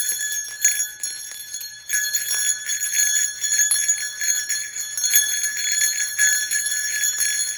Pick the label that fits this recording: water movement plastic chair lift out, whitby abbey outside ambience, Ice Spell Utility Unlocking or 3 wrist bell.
3 wrist bell